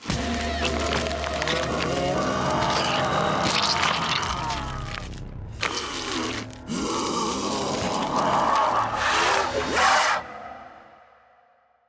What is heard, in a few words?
Enrage Transformation; Devour; The Inn; Zara